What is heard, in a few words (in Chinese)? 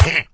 幻术师受伤声